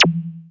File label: Bad Click; Buildalot